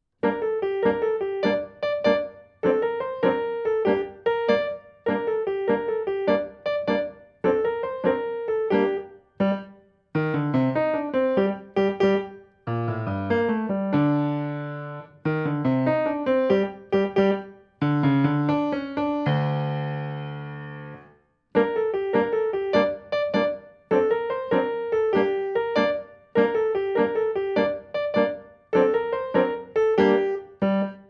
Tarantella

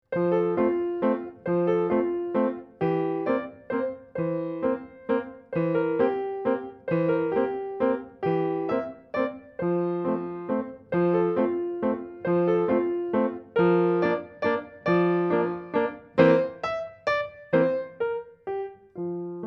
Viennese Waltz

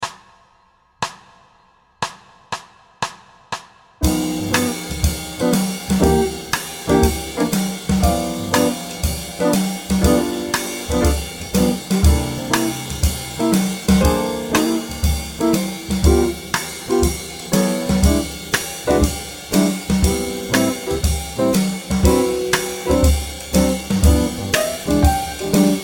Jazz Blues Swing G 120; Bass Practice Tracks

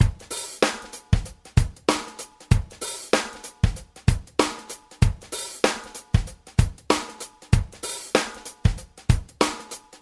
Hip Hop Loop 96

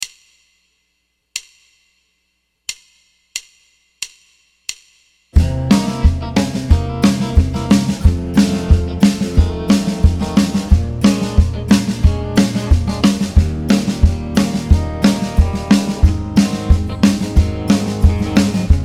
Cycle Train 90; Cycle of Fourths Train; Bass Practice Tracks

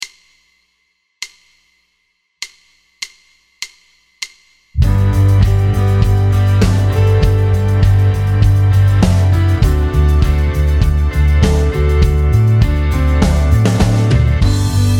One Five One G2 100 GTR

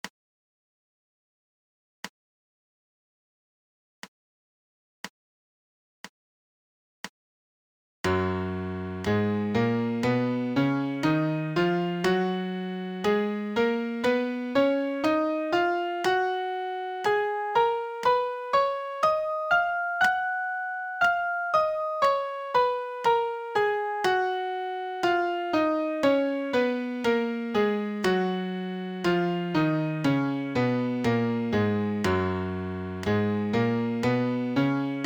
MIDI Major Scale Gb 60; Piano Practice Tracks

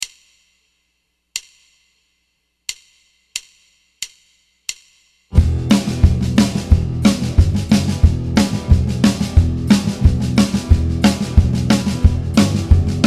Guitar Vamp Em

